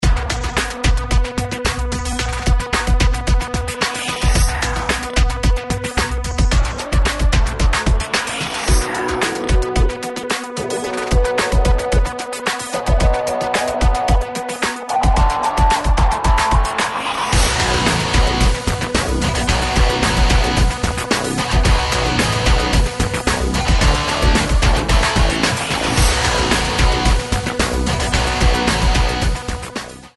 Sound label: Atom Bomb - 111BPM, Rock